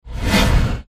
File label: spinstrike cast